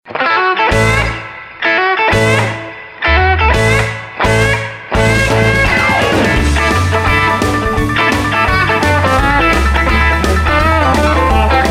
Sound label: Early Rock n Roll, ringtones